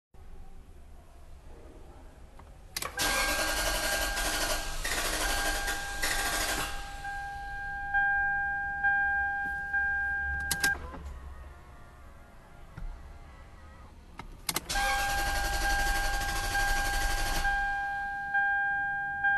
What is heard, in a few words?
ls430 engine; ls430 won't start; ls 3rd gen 2001-2006